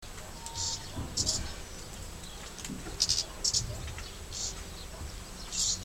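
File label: Phoenicurus phoenicurus juvenile calls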